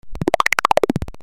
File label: notification; Infinix; A88